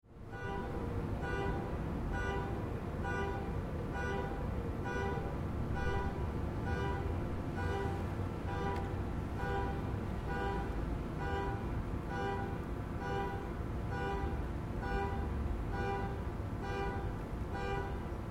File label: Car Alarm In City Late Night, Car horn going off repeatedly; in general city ambience; Car horn going off repeatedly, set off by car alarm, in general city ambience.; Car Sound Effects